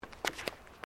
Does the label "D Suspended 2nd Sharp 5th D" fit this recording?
No